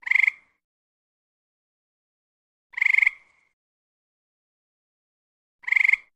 Frog Various

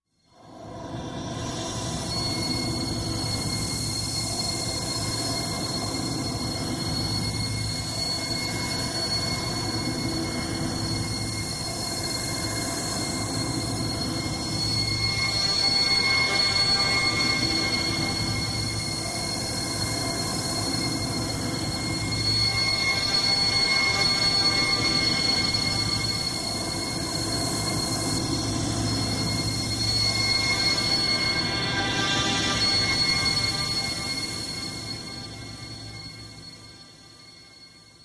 Subway Vision Subway Tu, metallic

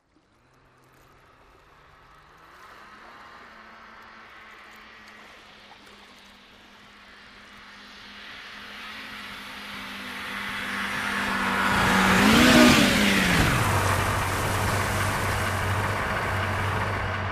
Jet Ski Small Jump Idl

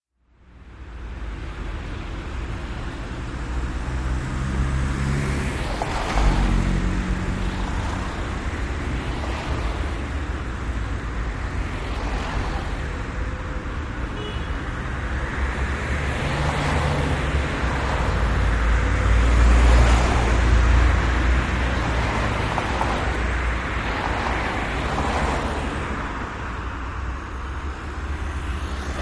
Traffic City Street, big city

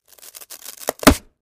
Styrofoam Sheet Crac, plastic

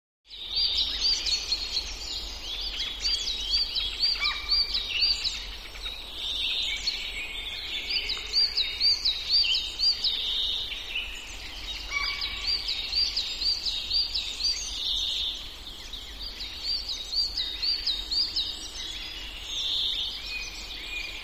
Heavy Woodland Birds

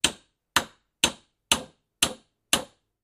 hammer hammering

